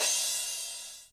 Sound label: crash, Korg Electribe ER-1; Kit 19